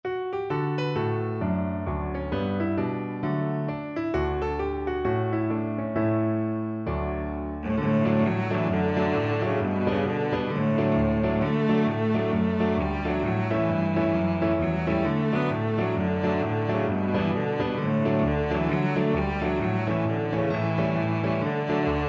all for me grog, cello

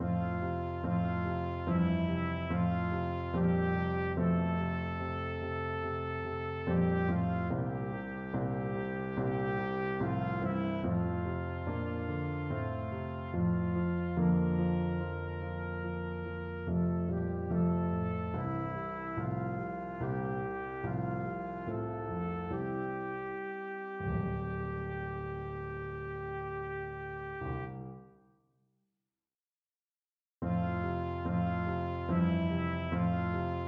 schubert d960 trumpet

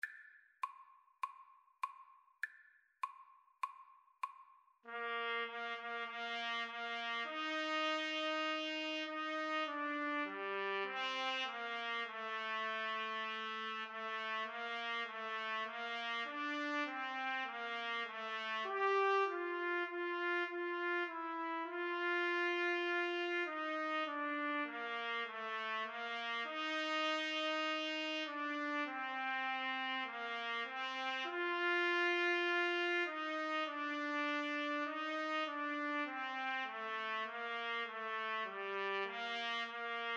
crown him trumpet duet

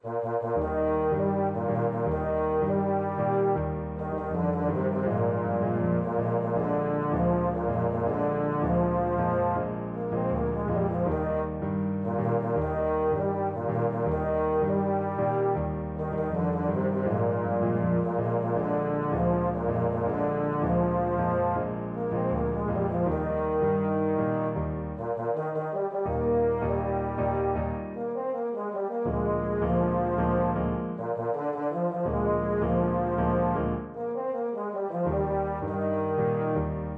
baritone horn; la cucaracha

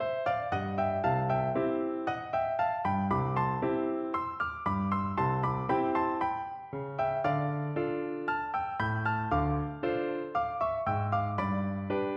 por piano duet

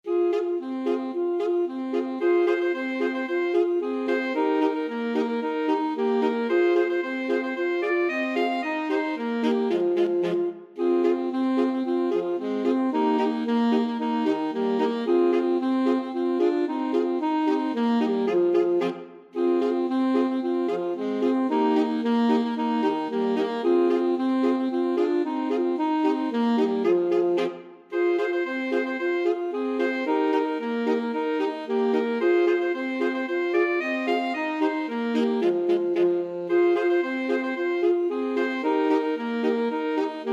drunken sailor, alto sax quartet

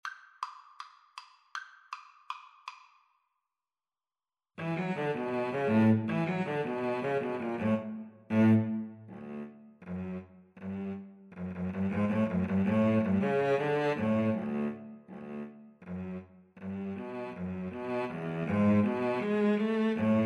the entertainer, cello duet

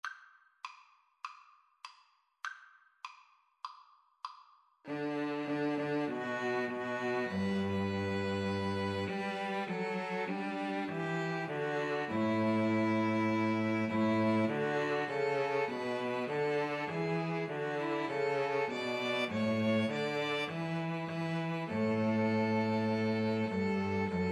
String trio

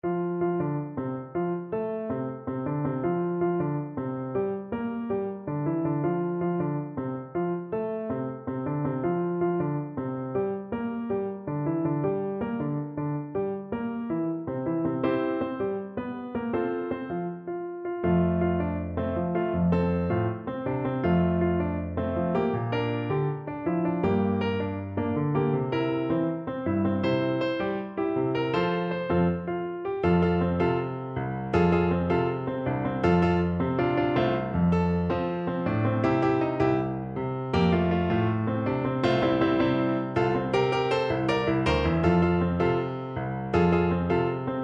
jingle bells salsa FL kar, Flute

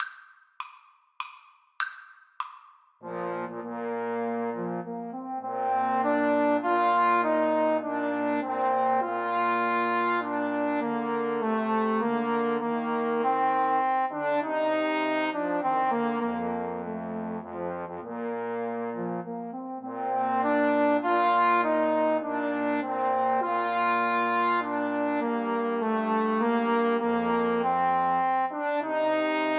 Trombone Trio; mendelssohn nocturne

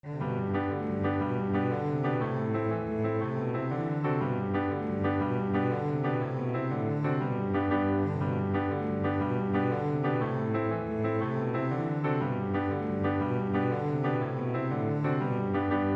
irish jig medley, cello

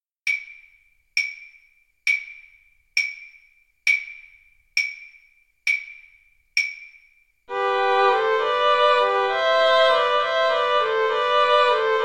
pastorale VLNS

